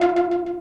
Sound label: synth; osu